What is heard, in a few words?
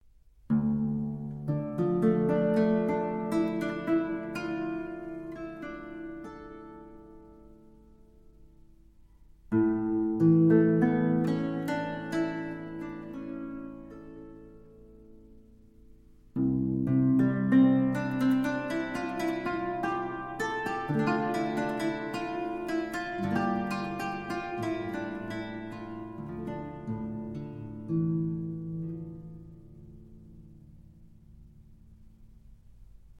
Transitio (Improvisation); Lachrimæ Caravaggio